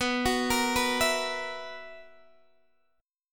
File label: B Major 7th Suspended 4th B down Guitar DADGAD